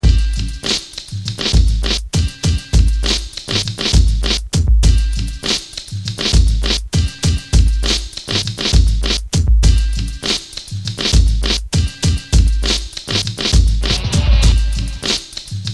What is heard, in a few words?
(Instrumental); B Boy records